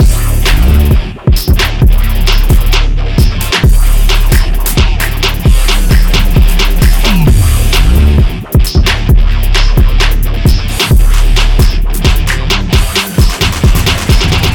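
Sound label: hiphop breakin 132 bpm loop; left4dead2; fortnite emotes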